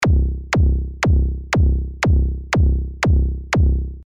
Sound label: drop kick